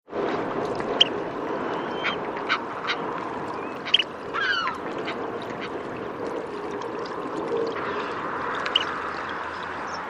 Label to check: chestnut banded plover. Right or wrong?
Right